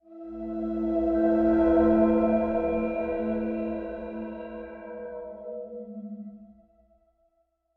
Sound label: toy pickup